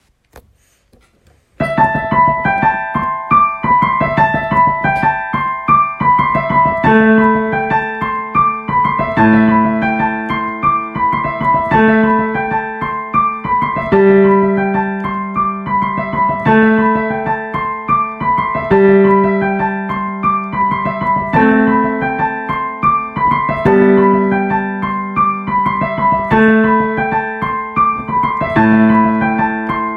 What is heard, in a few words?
Tubular Bells Home Recorded